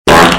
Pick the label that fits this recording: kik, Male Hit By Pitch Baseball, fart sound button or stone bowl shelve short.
fart sound button